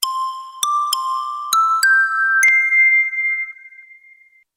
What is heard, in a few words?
Notification